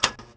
drum, mm1; weapons; Soldier Of Fortune II Double Helix; XBOX

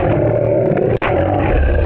termite growl

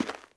footstep walk dirt

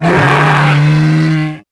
Tric Call, Dino

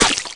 Bullet Water, Impacts

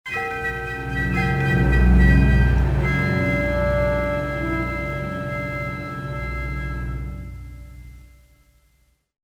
swamp, music; Star Wars Jedi Knight II Jedi Outcast; XBOX; yavin swamp

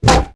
baseball wood hit; projectiles; melee hit; Max Payne

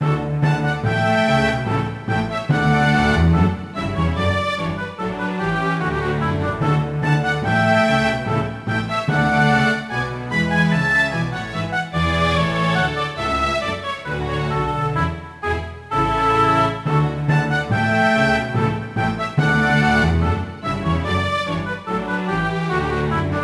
dance normal; Sid Meiers Pirates; XBOX

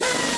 air wrench MISS; Cars; XBOX

